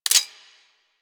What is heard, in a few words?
Tommy Gun Semi Auto Switch; Weapons; Warframe; Tenno